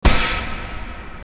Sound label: Cinematic Flash